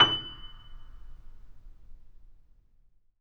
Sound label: PP F#6, Grand Piano off mic; AKAI S6000 CD-ROM - Volume 2